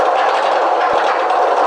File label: Chain Lift